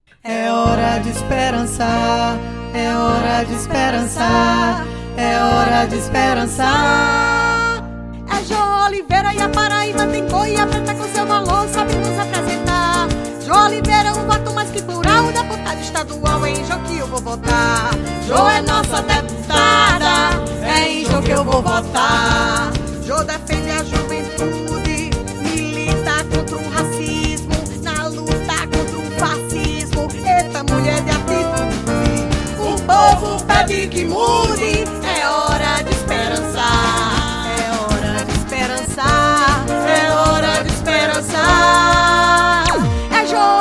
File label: jingle